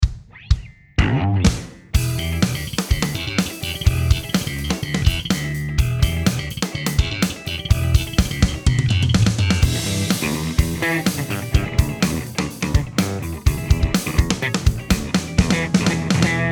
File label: 125 BASS funk Em